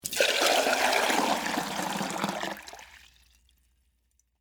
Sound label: Water Pour Plastic, From, Plastic, Container, Into, Metal, Flower, Pot; Pouring; BlastWave